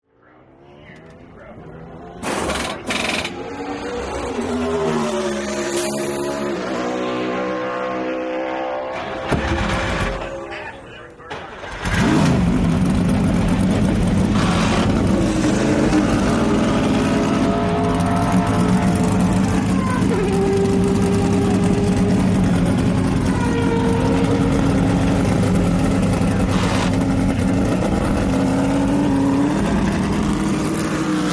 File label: Sports Car Race Pit